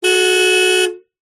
vehicles car hyundai trajet horn beep single external; 2006